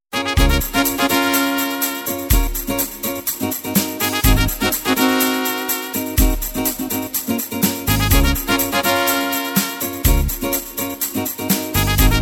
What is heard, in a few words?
Polka Latino, Oberkrainer